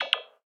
construction robot; factorio 2.0.55

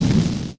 fire impact; factorio 2.0.55

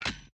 rail signal inventory pickup; factorio 2.0.55

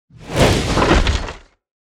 car debris; factorio 2.0.55

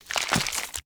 small gore; factorio 2.0.55

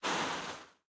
sulfur inventory move; factorio 2.0.55

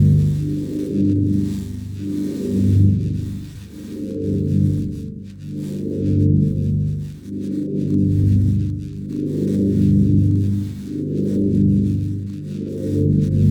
beacon; factorio 2.0.55

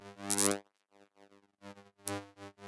accumulator working; factorio 2.0.55

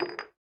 science inventory pickup; factorio 2.0.55

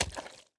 shallow water, walking; factorio 2.0.55

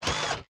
repair pack inventory move; factorio 2.0.55

